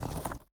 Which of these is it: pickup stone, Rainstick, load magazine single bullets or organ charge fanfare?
pickup stone